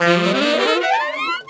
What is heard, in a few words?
Sax Section Rip in F, Jazz; Sonic Foundry (Sony Creative Software); Jazz Solos and Sections